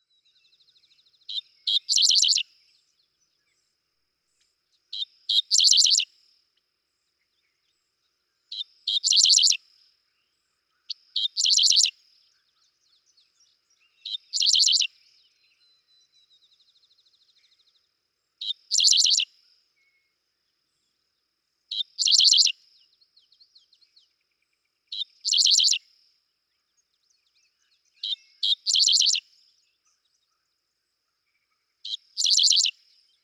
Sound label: Dickcissel